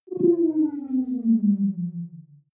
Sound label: wobble drop high